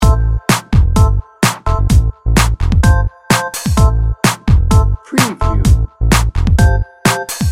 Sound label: bouncy beats loop